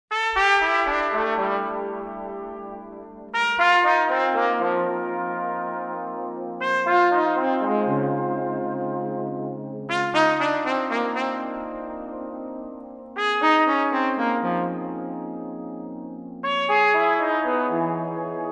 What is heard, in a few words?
Trumpet Voices